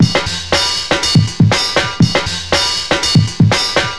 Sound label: acoustic cymbal